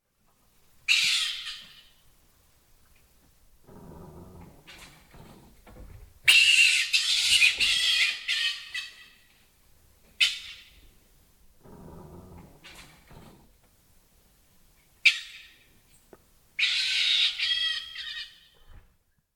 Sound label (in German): Steinmarder Martes foina